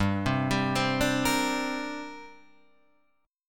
D 7th Suspended 4th Sharp 5th, G

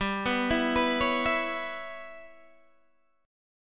G 6th Flat 5th, Guitar, DADGAD

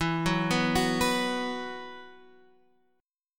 B Suspended 4th, E, chord

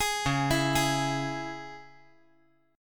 C sharp Minor, chord

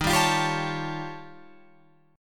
D sharp 9th Flat 5th D sharp, Guitar Open F; D#9b5; strummed

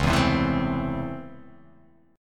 C sharp Minor Major 7th Sharp 5th C sharp, chord; x,4,2,5,4,4,2,x

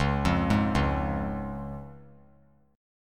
C sharp Major; arpeggiated; JGuitar

